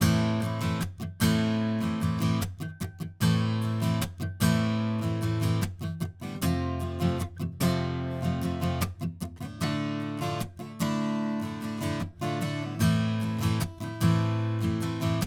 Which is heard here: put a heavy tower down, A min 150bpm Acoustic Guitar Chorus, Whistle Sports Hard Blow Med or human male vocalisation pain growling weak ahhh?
A min 150bpm Acoustic Guitar Chorus